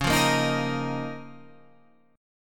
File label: C sharp 6th Add 9th C sharp down Guitar Half Step Down; C#6add9